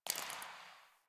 Derelict Interior Water Drip

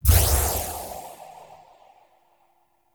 Health Restore Success, Heal Stingers; Player; Envoy

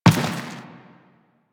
footstep dirt; Enemies; Warframe; Teshin; DE

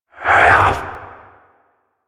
Possessed Red Veil Vocal Attack; Enemies; Warframe; Priest Quest

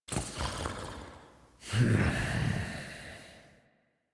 Snores; Warframe; Deimos; Father; Vocalizations; en

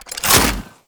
Gun Reload; Warframe; Thano Tech Arch Gun

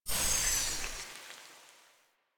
Sticky Bomb Open Ready; Grineer; Veilbreaker; Vor

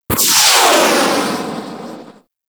RPG Rocket; Hellion Elite

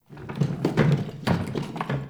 Witch Station Workshop Rattle; Ambience

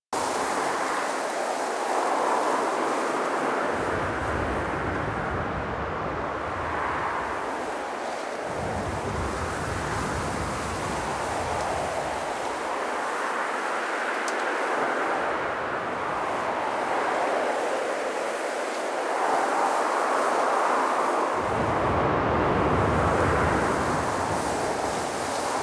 Exterior Background Amb Loop; Ambience; Warframe; Duviri

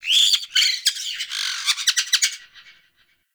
Bird Alerted Caique Call; Midrath Island; Wildlife

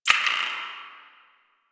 Next Slide; Class Lesson; Warframe; Quests; The New War; UI; Zariman Class Quiz

